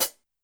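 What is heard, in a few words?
Hi-Hat Pedal; YAMAHA RM1X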